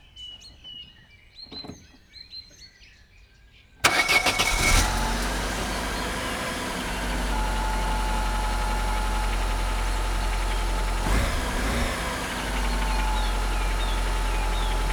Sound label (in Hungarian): motor indítása lecsukott motorháztető; vízház; autó opel; cardioid